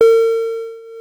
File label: headset chime